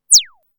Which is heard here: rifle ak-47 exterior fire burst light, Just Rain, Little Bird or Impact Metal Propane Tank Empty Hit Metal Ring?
Little Bird